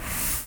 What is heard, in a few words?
swish